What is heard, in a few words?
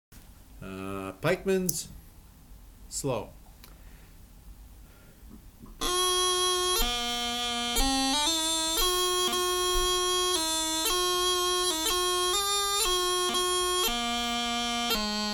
March slow; Pikemans